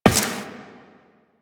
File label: Step Glass, Footsteps Walk; DE; Warframe; Tenno